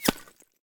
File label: Lagoon Freezer Gun Miss